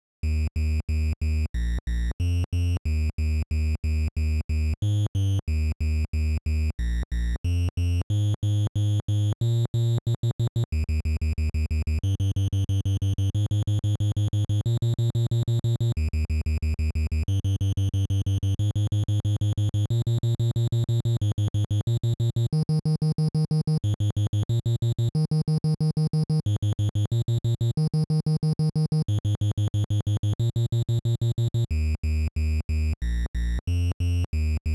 triangle, 183 bpm; stems; PunkProject

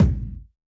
Kick - Cinematic Funk; Electronic Drum Samples; Kicks; E-Punk Project Drum Samples

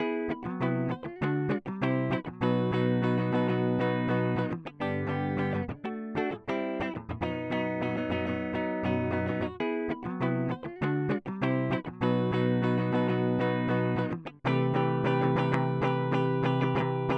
Guitar Dry, Punk

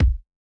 Kick - Cinematic Funk; Electronic Drum Samples; Kicks; E-Punk Project Drum Samples

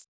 Analog Hi-Hat, Electronic Drum Samples; Hi-Hats; E-Punk Project Drum Samples

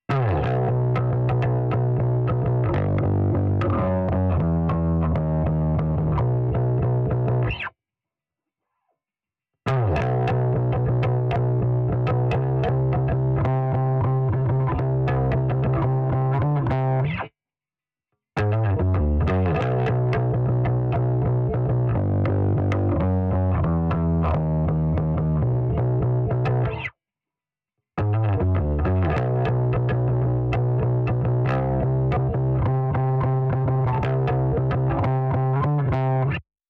Bass, Punk

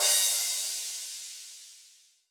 Hi-Hat Heavy, Electronic Drum Samples; Crashes

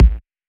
Electronic Tight Drums, Kicks; Electronic Drum Samples; E-Punk Project Drum Samples

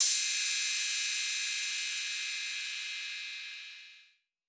Hi-Hat Heavy, Electronic Drum Samples; E-Punk Project Drum Samples

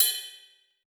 Hi-Hat Heavy, Electronic Drum Samples; E-Punk Project Drum Samples

